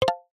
multimedia ui tone short plucked nylon

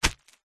foley footstep single on plastic sheet

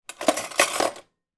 household cutlery movement on draining board

